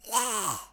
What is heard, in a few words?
Cut throat